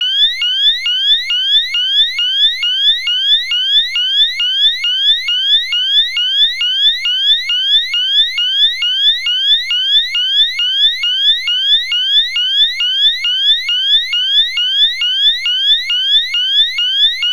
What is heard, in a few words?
ALARM, Piezo Siren